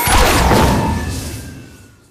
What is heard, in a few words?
plasma rifle fire